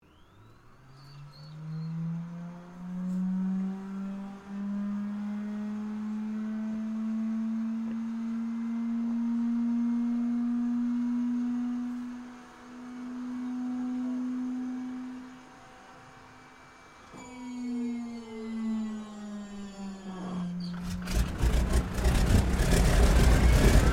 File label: AIRCRAFT AT-6D HARVARD MK III STARTUP TAXI; Harvard Training Aricraft; Airplanes; Vehicles